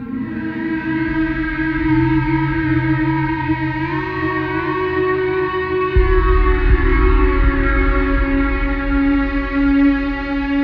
E-bow Slide Drift, Guitartronics, Ethereal Textures; Sonic Foundry (Sony Creative Software); Ma Ja Le Ethereal Textures